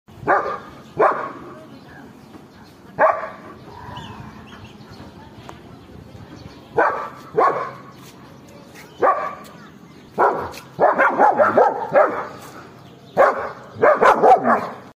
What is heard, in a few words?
German Shepherd